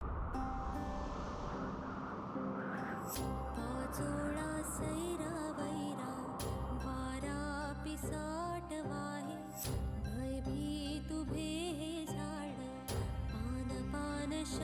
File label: Marathi Song